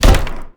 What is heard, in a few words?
impact wood medium